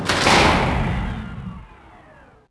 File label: Control Room Shutdown; Max Payne; subway